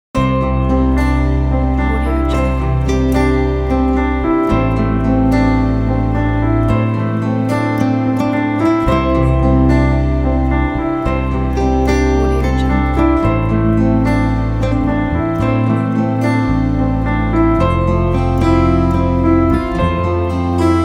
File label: wedding loop